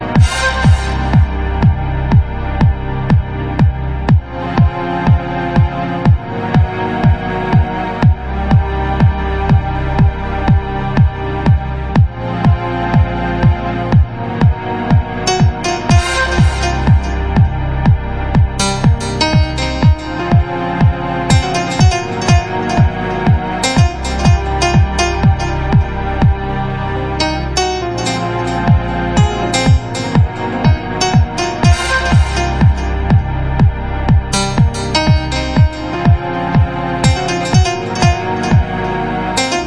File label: loose piano